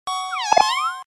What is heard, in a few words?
Meow Instrumental